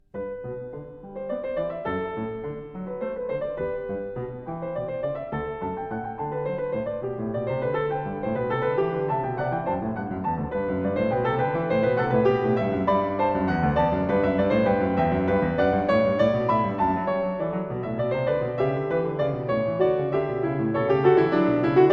Goldberg Variations, BWV 988 (Piano) Variatio 3 a 1 Clav. Canone all Unisuono; J.S. Bach